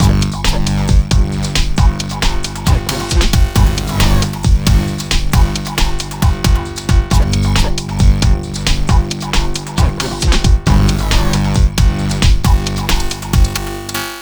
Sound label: Hardcore Breakbeat, 135 Gmin; Big Fish Audio; Hardcore Breakbeat Action